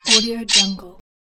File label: sword sound